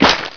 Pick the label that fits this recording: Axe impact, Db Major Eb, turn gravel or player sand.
player sand